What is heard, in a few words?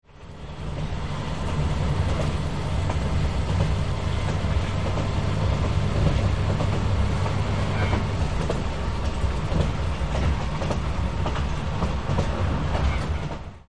kiha65; tobi